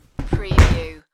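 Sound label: Body Fall Wood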